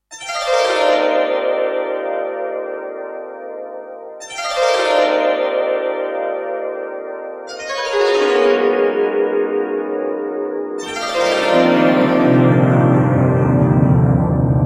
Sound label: Fusion Pianoharp